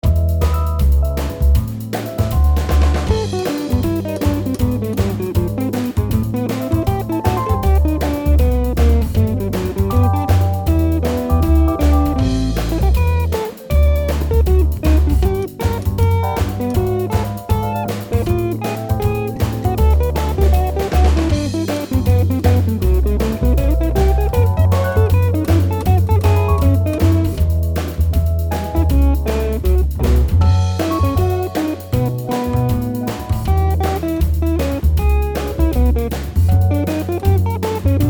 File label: improvising 158bpm solo Mix